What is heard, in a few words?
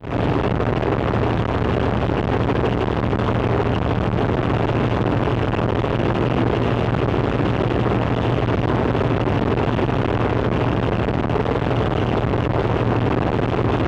processor noise